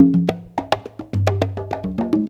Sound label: CONGA 106, PERCUS LOOPS